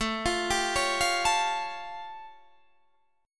A Augmented 7th A

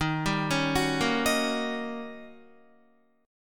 Eb 7th Sharp 9th Eb, Guitar; arpeggiated; 10,10,13,11,10,11; 4F,4A,5C,5G,5C,6E

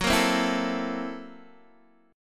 F sharp 7th Sharp 9th Flat 5th, Guitar, Open D

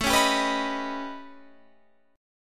A 9th Flat 5th Guitar Half Step Down, chord

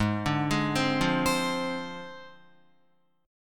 Ab 6th Flat 5th, Guitar, Ab6b5 chord; Ab6b5 arpeggiated; JGuitar

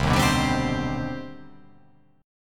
C Minor Major 9th C

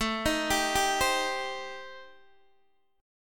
A-Minor 7th Flat 5th, Guitar Open D, chord

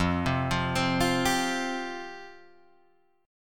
F Suspended 2nd F Guitar Drop D

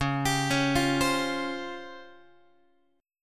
Db Major 7th Flat 5th, Db, Guitar; arpeggiated

